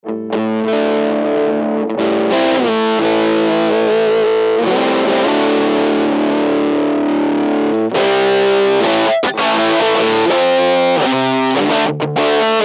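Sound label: OD guitar bypass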